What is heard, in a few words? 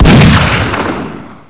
FA18 FIRE